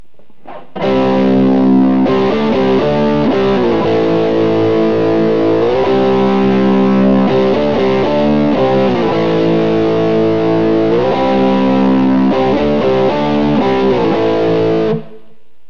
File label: neck crunch; burny; fugly clips